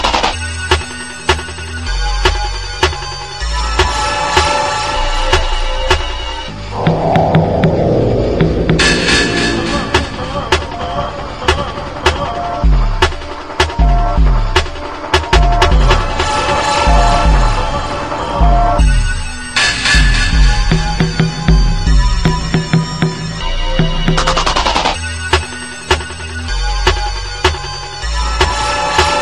filter jungle

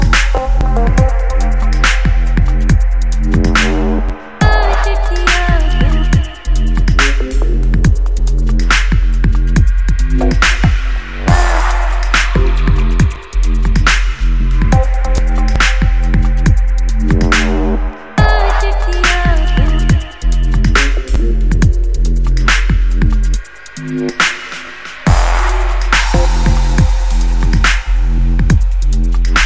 tuba abyss

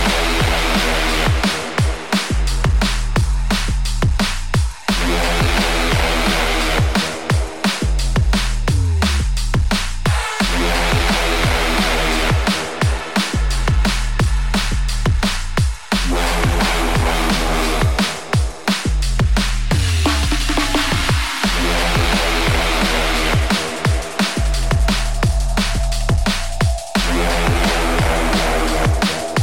drum gods

